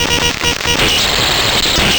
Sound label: Distorted Reality; Spectrasonic; DIGITERROR